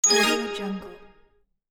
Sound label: digital transition